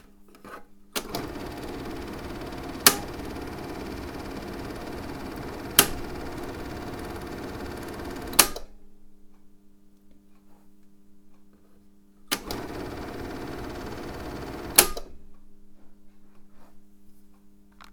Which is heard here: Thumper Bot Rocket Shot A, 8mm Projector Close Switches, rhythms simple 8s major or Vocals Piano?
8mm Projector Close Switches